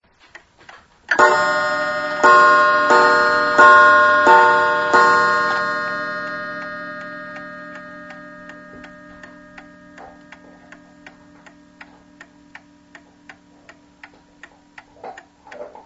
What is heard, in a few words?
chimes of our new clock